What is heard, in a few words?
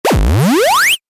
Retro SFX, Retro Style, Harsh, Heavy; Tech